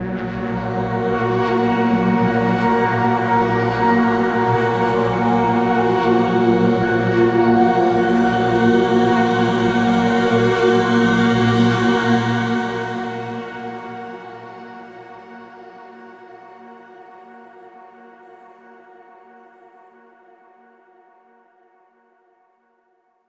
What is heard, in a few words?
Drone